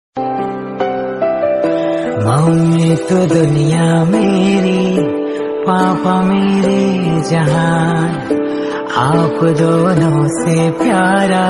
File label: Song Ringtone